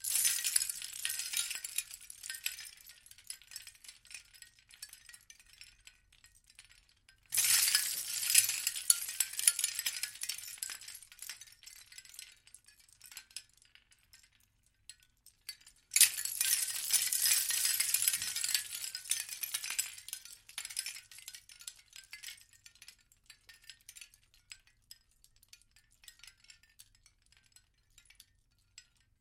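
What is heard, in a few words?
sound of many shells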